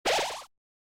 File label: heal; undertale